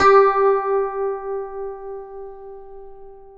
G3 DULCIMER; AKAI S6000 CD-ROM; WorldSounds

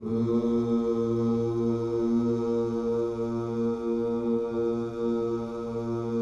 OOS A#2, Gospel; ILIO; Vocal Planet

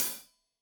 Hat Loose, Hi-Hat, Cymbals Stereo

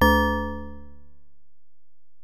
Bell, Alesis DM-PRO

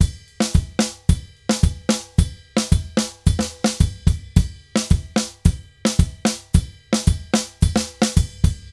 Drums Murga 110 bpm; Best Service; Festa Latina VOL-1

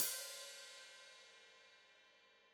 Hat Foot Open, Hi-Hat; Deeply Sampled Drums

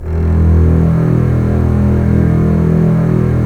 STR CBS, Roland LA Composer; Roland - LA Composer vol. 4

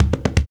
African Perc

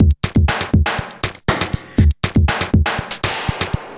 Beat, Industrial

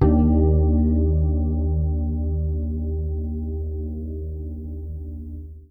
FRET CHORD G, PEDULLA BASS; Masterbits CLIMAX 7 - Bass

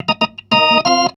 B3 LICK D#, PLAYED

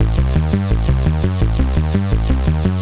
MatrixBass 170 A; musicradar; bass boutique

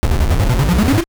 Noise A, 8-bit, Bitty Noises

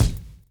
drum chop; AMG Gota Yashiki Groove Activator

